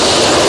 Sfx Wintergames

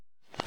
Footstep Wires Rev; Sx Footstep Wires Rev 01

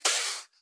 Rattle Snake; gamerip

